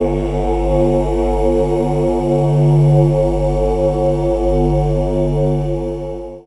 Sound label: Riddim Choir, Broken G; One Shots; Ghosthack; Riddim Essentials 2020